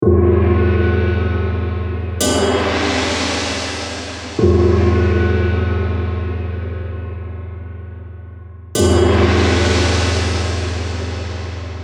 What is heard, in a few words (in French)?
gong; Les instruments de musique